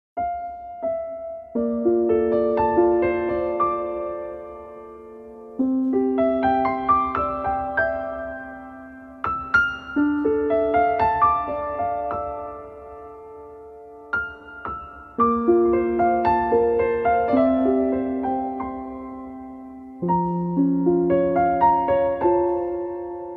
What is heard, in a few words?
Piano; Birth of a Beauty; Korea 2014 OST